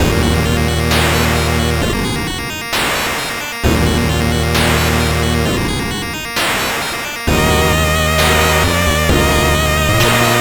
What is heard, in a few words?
Background - Colossal Evil; dwellers empty path